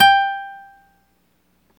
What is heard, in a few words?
GTR Nylon, Roland